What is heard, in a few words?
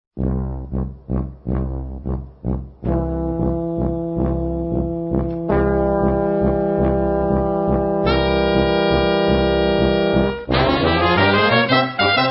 cocek